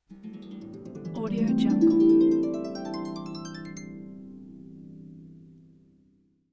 harp

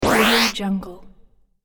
game respawn

